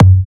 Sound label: Basic Tom F#; samples